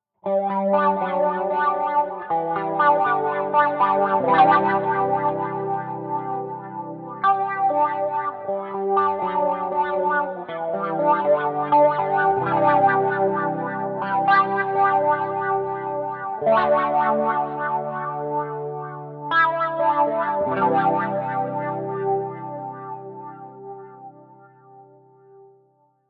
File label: auto rate